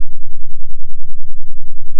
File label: additive cello single; itakura saito; FFTimbre